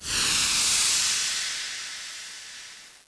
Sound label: skill ice splinters; warcraft3